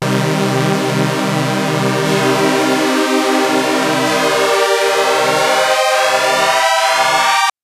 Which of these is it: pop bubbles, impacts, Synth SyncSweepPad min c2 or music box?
Synth SyncSweepPad min c2